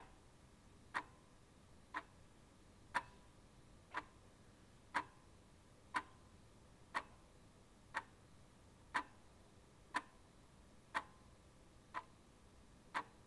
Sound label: Clock Tick; Trevor Webb